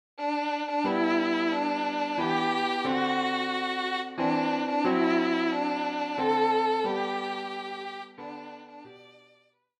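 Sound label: violin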